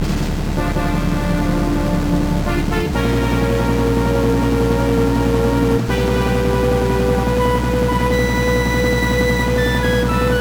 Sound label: Jingle ~ Severe Earthquake; Shining Force Resurrection of the Dark Dragon Original Sound